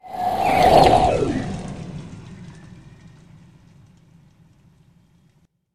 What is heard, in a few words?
Water Swoosh